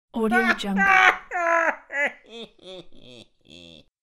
loud pirate male laughter; human sounds; خنده دزد دریایی مرد

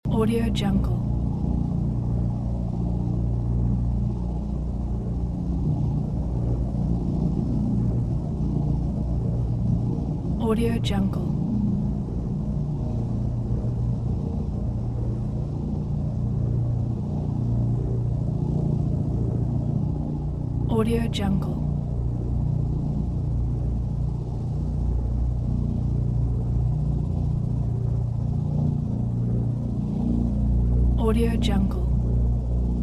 helicopter interior loop sound

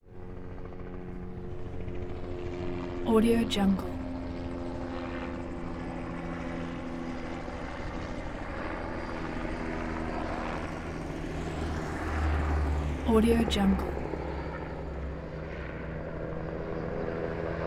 helicopter circle police helicopter

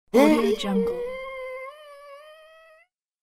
low male kill moan

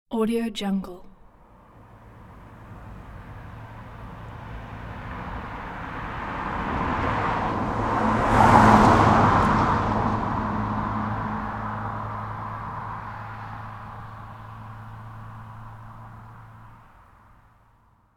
speedy car pass by behind another

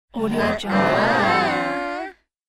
funny slow amazed group

